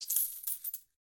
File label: Coins Add; Game rip; MiSide